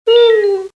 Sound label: r2d2; Star Wars; Star Wars Misc